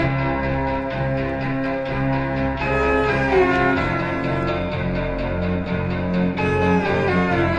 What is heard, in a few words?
violin bass smog b, bass loops